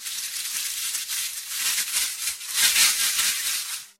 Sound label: skittering leaves wind